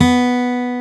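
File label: acoustic guitar B3; Proteus1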